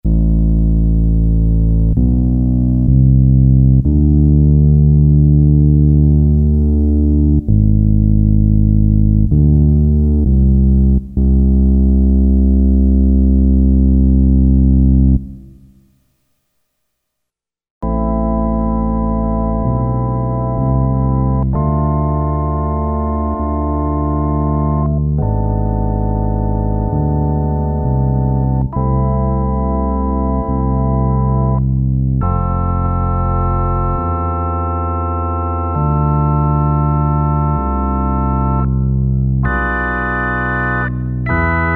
ORGAN BASS PEDALS, Roland Jupiter-8; Factory patches

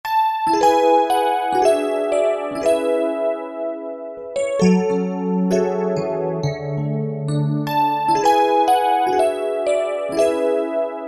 80's FM, Roland SRX-07 Ultimate Keys

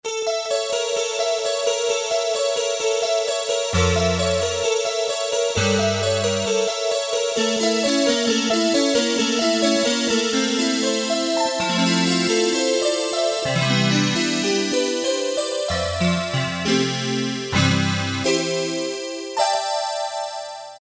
Metallic Sound + Synth Ensemble, Casio CT-370; Tones

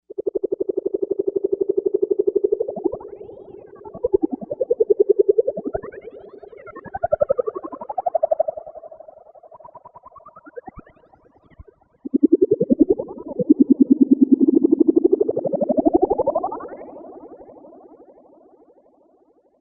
Sinister, Novation UltraNova; Factory Patches

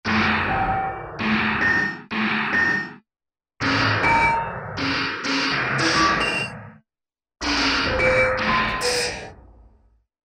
Blow Out, Korg MSC-14S Sound Effects

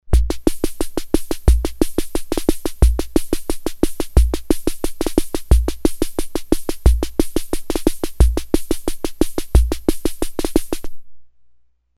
MIXED, Ace Tone Rhythm Ace FR-2L

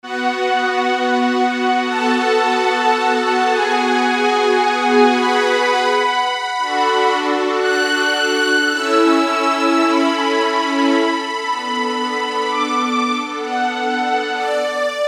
Light Strings

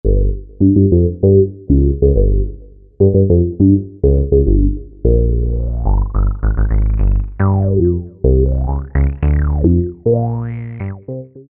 Space Bass; Dance; Roland SR-JV80-06

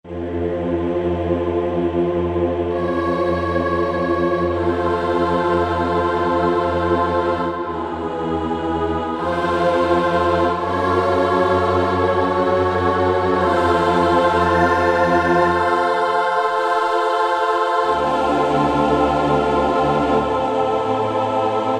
Choir, Korg 05RW

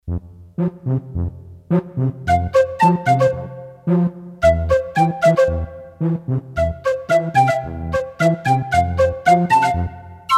Breath Ensemble, Roland D-10; Factory Patches

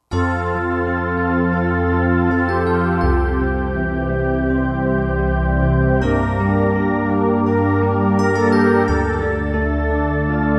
Euro E. Piano, Roland JD-990; Factory Patches

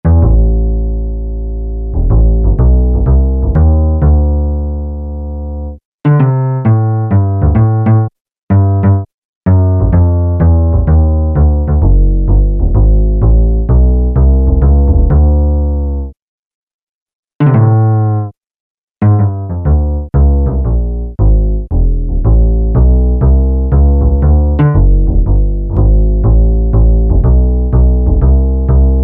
Acoustic Bass, Peavey DPM Spectrum Bass

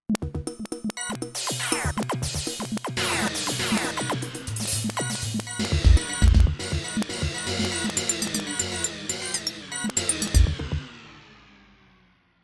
Sq FX loop

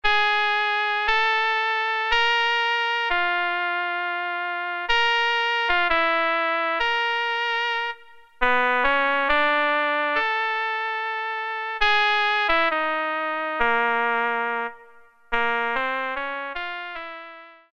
Oboe, Roland Alpha Juno-2; Factory B

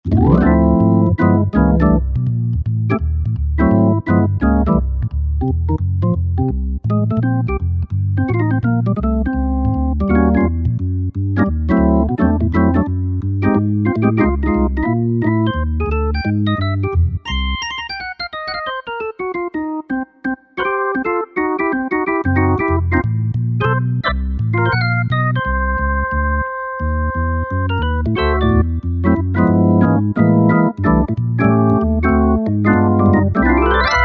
Or Jazz LH Bass, Yamaha EX5R; Factory presets

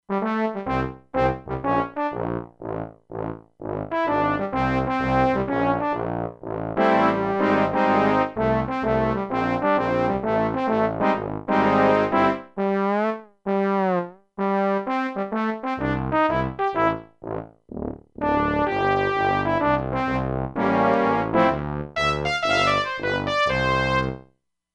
Bone & Tuba, E-mu Proteus-2; Factory Presets

